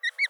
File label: Chestnuts FLPT Flight Call